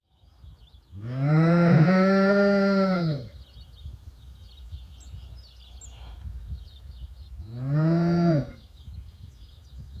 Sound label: a cow mooing at farm